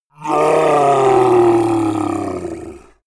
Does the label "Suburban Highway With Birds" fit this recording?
No